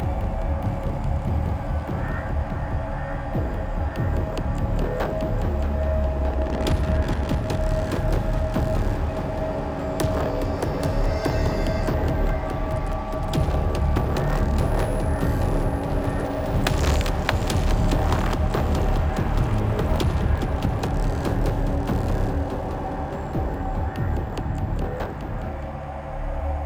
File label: rune 144bpm loop; Doom 2016